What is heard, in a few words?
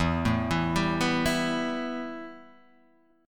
A 7th Suspended 2nd, E